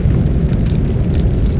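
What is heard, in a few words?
fire loop; Tony Hawk's Project 8; XBOX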